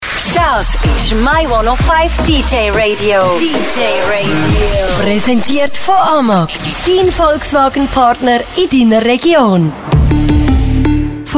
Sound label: Single Jingle; AMAG VW